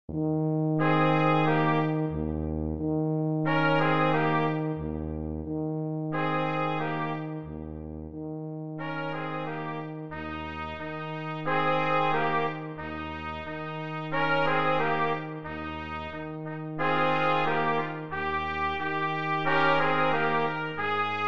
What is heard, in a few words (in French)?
ch ti blues cuivres